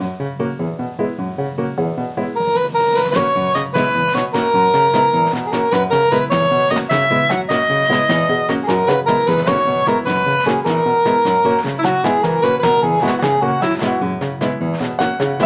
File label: Bamboo Slicing